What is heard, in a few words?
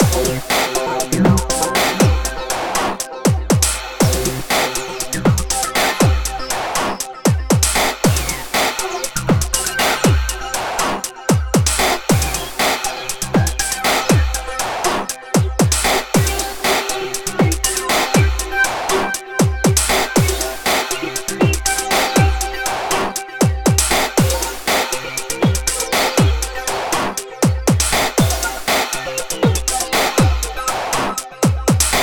Action Loop